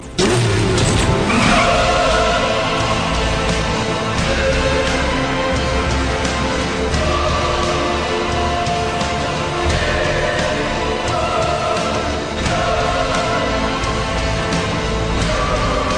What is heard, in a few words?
movie trailer